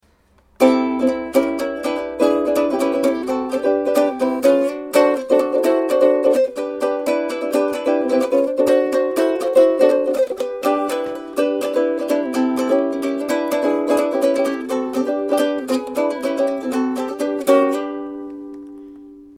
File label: strum test, ukulele